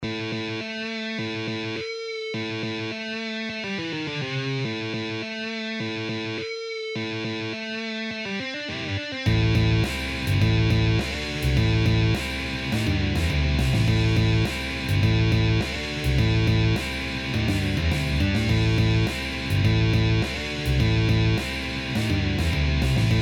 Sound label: Drain Man Brain Fightin Guitars; gitarove materialy